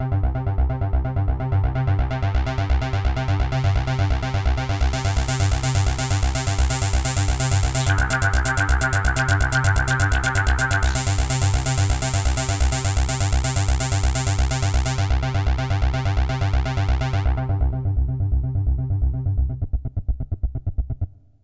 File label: Yamaha CS1x filtered riffs; FM 57 - The Ultimate Sample Collection (1997)